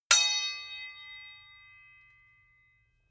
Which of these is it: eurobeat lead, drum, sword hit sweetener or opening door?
sword hit sweetener